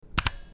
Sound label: keyboard